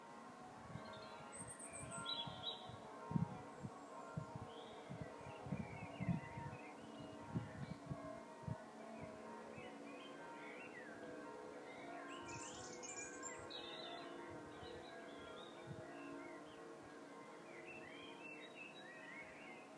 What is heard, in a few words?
lake district uk evening sounds may; new zealand